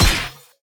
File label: attack light; bloom minigame